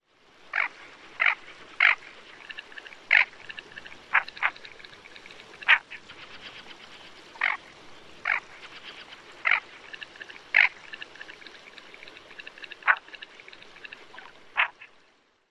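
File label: quacks and other sounds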